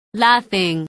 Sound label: laughing